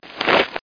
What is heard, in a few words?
snow ft